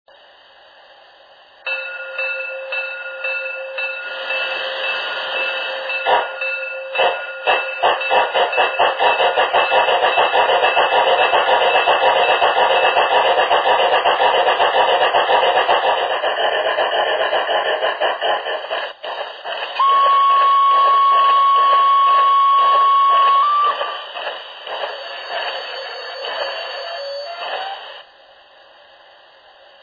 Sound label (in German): loksound